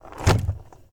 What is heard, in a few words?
Chest Close